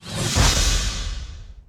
skill magic

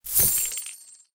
coin

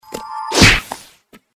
attack; hero

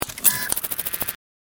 skill magic

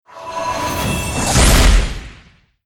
attack; hero

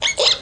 skill squirrel, battle

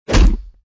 hit

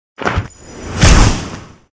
attack; hero

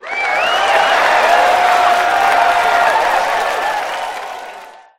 level up

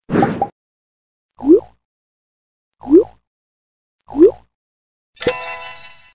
chenggong effect; capture

